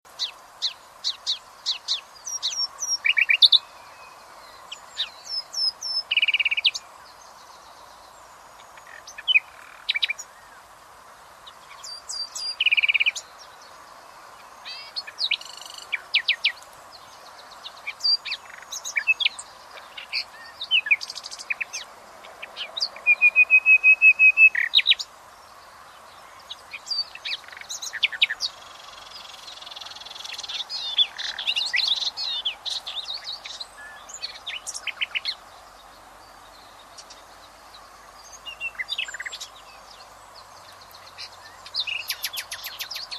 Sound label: Luscinia megarhynchos; SETE